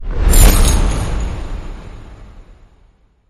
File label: Weapon Shells Whoosh